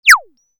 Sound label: Little Bird